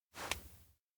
touch cheek; awayuki; anemoi

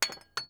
put shaker; anemoi

